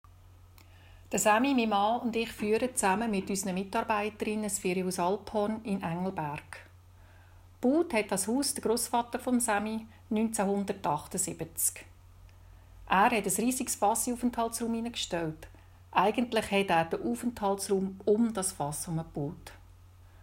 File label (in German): Alphorn; Ferienhaus